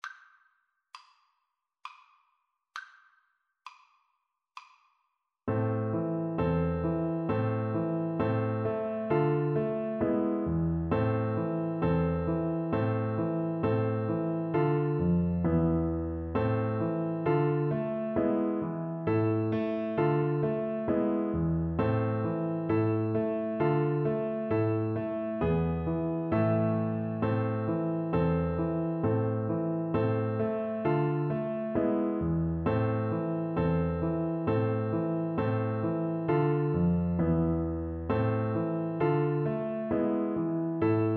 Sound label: Recorder; count leon